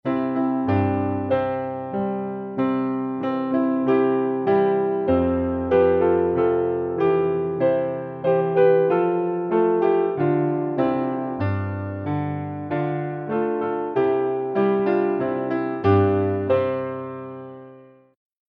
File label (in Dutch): Rijden maar 2e stem